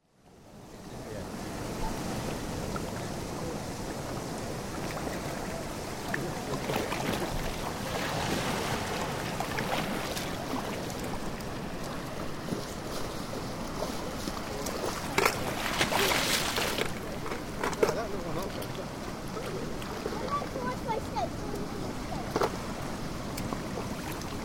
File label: waves splashing at rocks on the shoreline